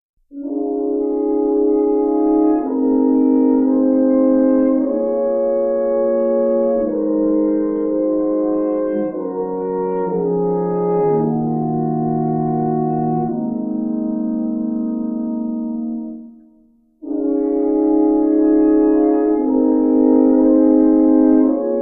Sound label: Lullaby